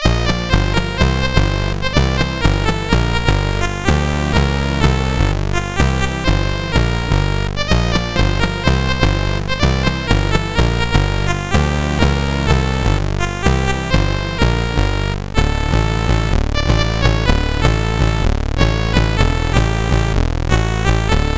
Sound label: Conga, MOS6581R4; Commodore Conga